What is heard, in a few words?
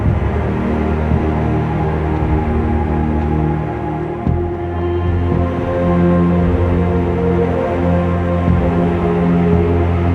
Camp Spawn; Warframe; Cinematics; New War; Mission Two; Drifter